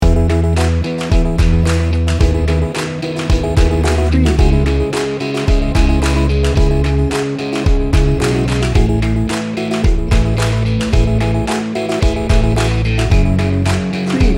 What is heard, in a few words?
summer sunshine loop